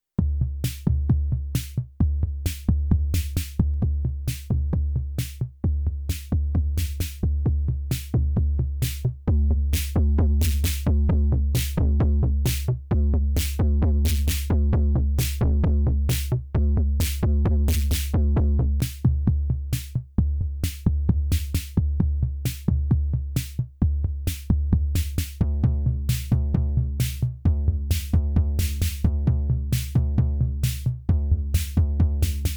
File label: drumbrute impact